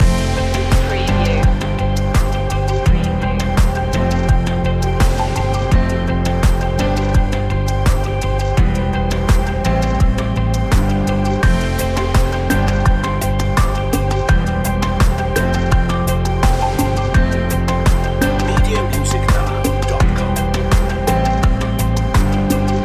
breaking out loop; pop

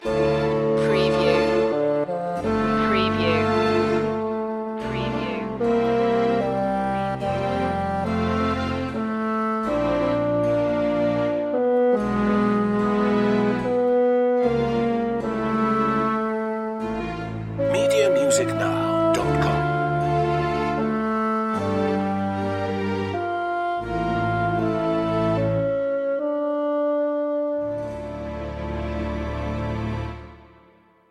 adagio in d minor, Classical Influences

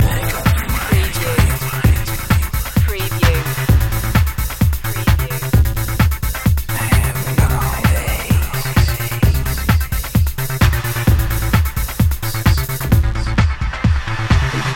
thrillseeker loop; dance; trance-techno

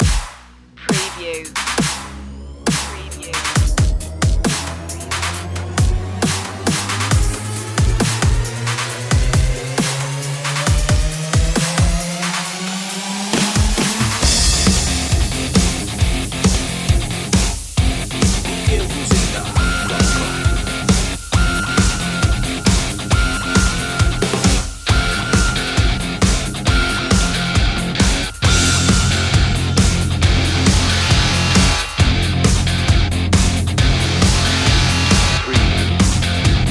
no vocals

